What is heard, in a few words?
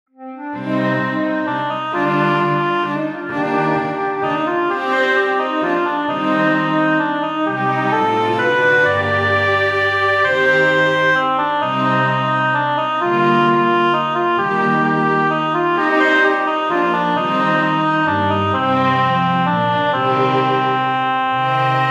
mazurka; auvergne